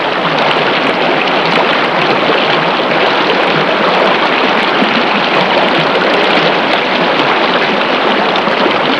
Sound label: jungle river